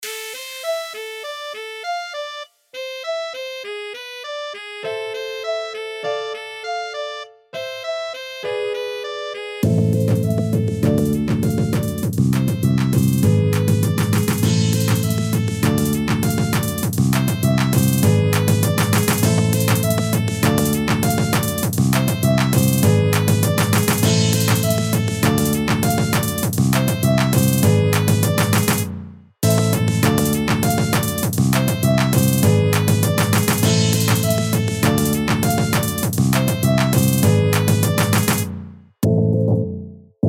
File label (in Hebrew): ביט מגניב